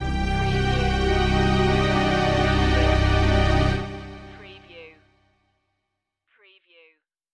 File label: Stab Strings